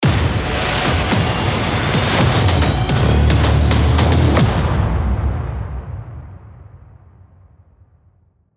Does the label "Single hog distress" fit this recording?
No